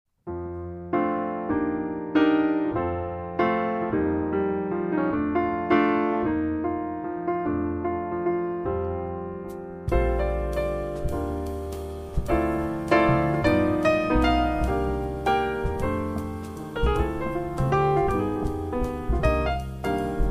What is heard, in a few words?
Soft Trio Jazz Instrumental Cocktail Dinner Ambiance; Cover Band